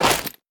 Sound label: Footsteps Dirt; We Are the Dwarves